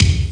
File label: kick; gravis